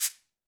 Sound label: percussion, Roland TD-20